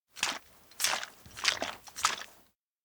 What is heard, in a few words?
shaker shake exaggerated; anemoi